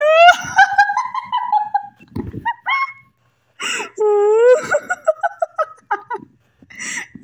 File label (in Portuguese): risada